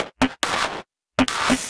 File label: Loop 140 BPM; Vengeance Samples